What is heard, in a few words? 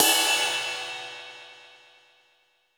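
RX5 cymbal edge; 90 sSampleCDs; Crypton - DrumBase5000; COMBINATION1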